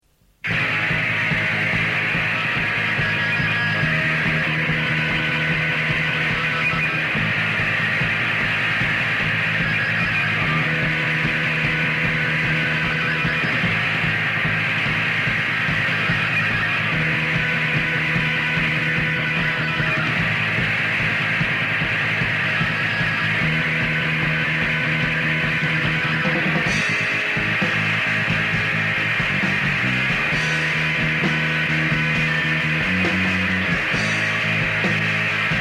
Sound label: noise